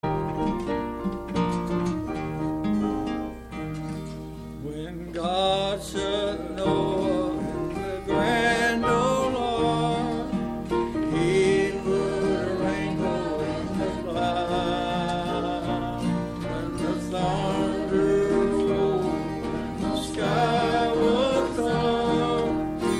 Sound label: Choir; God Put A Rainbow In The Clouds